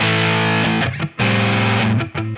Electric Guitar, Loops; Guitars

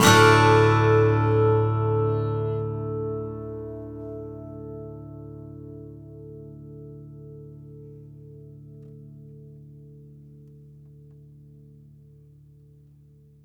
Acoustic Guitar; Guitars

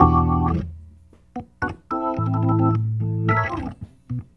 Organ

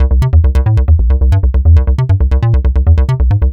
Synth Bass; Basses

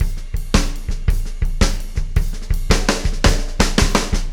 Acoustic Fill, Drums

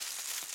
player climb; theGoonies; Retro

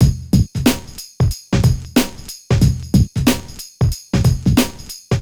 Urban Beat, Drums

